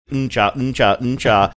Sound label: mmm cha mmm cha mmm cha